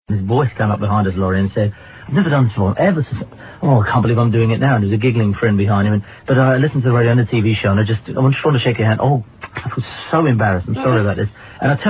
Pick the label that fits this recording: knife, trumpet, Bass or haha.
trumpet